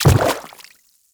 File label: Infested Footsteps Quadruped Medium Land Water; Warframe